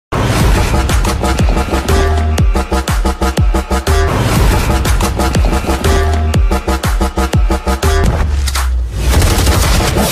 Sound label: animal movie bgm ringtones animal bgm fight scene bgm mass bgm; ranvirkapur